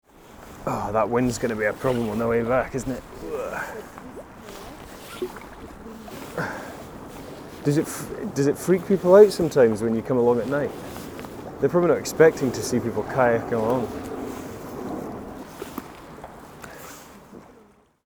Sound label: wind; kayaklibre; 2011 London